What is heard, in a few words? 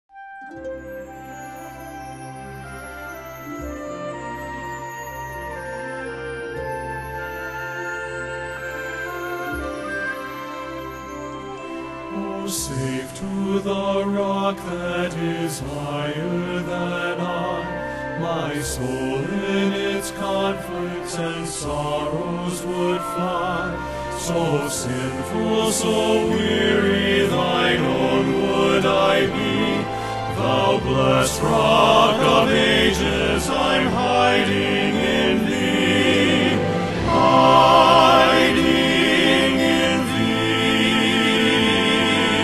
men's chorus